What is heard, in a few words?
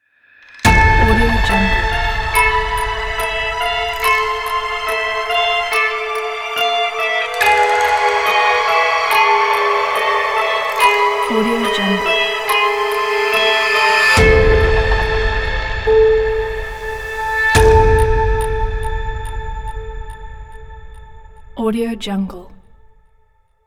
spooky halloween logo